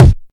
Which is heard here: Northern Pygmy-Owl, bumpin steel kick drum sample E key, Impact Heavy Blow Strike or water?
bumpin steel kick drum sample E key